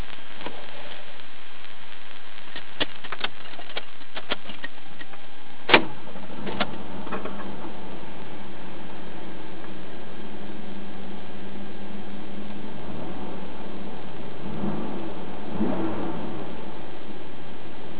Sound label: Stock Rev